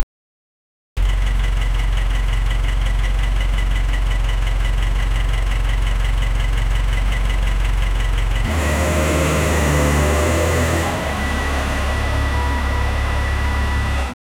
volkswagen bus boxer engine

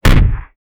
the snappy sound of a, lightning

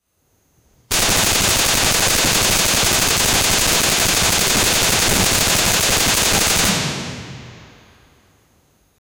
assault rifle shooting; automatic

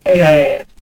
subtle menu button hover

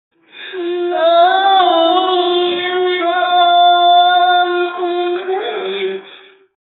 muslim prayer allah akbar, religious

